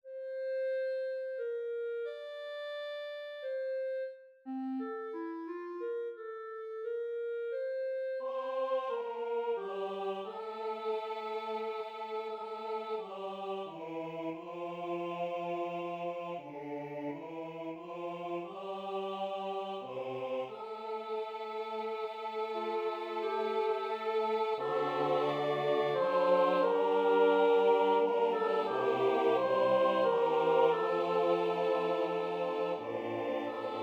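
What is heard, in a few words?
choir clarinet; 1부-기도송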